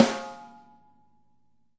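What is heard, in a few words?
snare A3, multisampled real kit; REAL LIVE KITS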